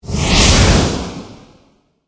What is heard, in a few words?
Rocket Launch Away, Warfare; Mortar; BlastWave